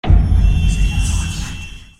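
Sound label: Music Failure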